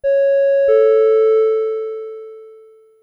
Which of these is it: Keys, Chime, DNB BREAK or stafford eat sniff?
Chime